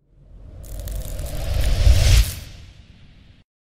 freeze icy crackling sound effect

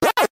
single waka; pax man

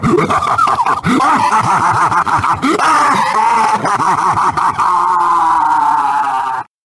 bruh laugh

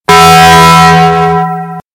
bong; taco bell

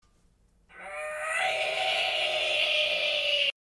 reeeee; pewdiepie

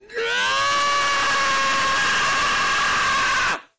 wetty scream

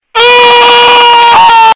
man screaming super loud scream

